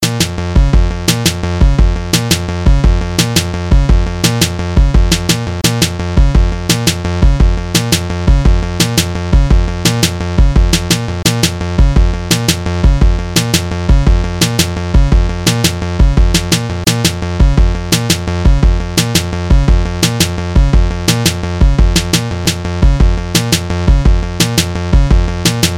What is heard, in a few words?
retro intense